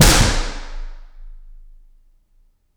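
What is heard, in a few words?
Lunch Room Center; Reverb; 29x37x12ft; FL Studio 9; Fruity; Edison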